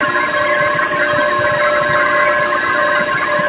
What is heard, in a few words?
computer console; nexus tower